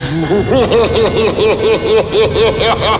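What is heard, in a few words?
laugh; cstrike; predatormod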